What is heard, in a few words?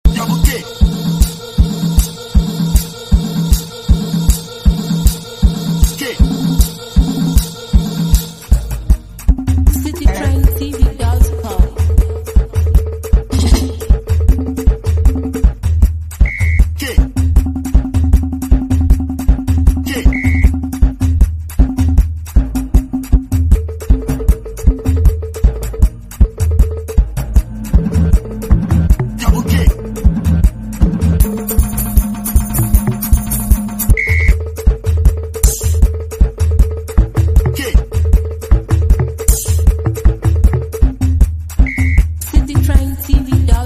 Beat